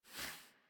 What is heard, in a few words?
Pet Snort; Warframe; Catbrow; Pet Ship